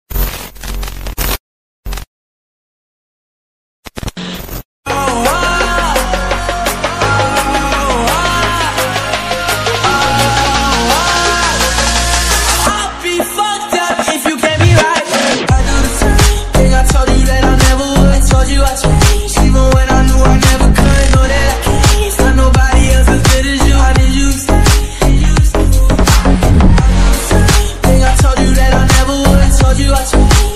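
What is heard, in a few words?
romantic ringtone background music ringtone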